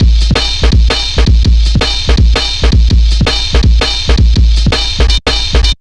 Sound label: 165, Drum Loops, Drum & Bass